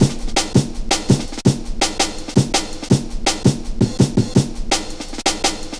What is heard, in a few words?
Pride, Drum Loops, 165.5, Jungle